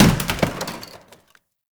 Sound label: Janitor Cart Impact; Warframe; Ambience; NineteenNinetyNine